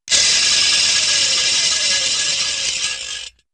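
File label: Large Winding Down Whir with metallic Clicks, cartoon; Hollywood Edge; Cartoon Trax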